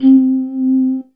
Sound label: E Mu Proteus FX Bottle C4